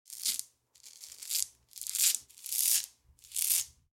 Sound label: Vegetable peeling with peeler; cooking